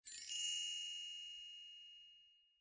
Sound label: chime quiet; snow white